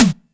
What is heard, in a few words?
Snaredrum, Roland MT-32; Drum Samples